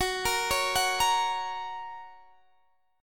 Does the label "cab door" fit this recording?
No